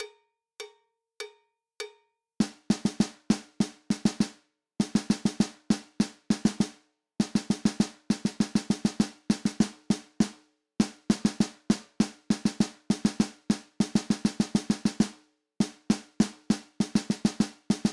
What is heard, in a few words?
Solo 100 BPM